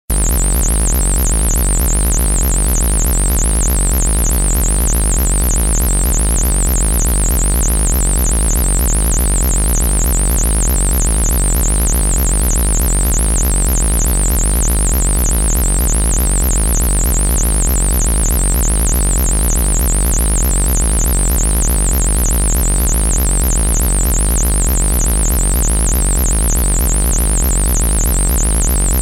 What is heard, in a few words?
Alien Buzzer